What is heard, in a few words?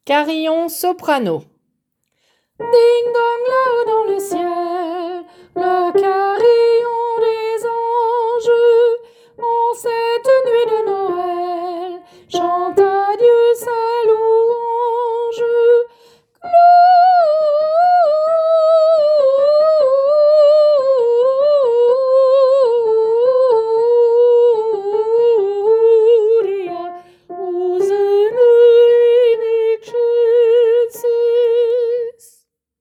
Carillon soprano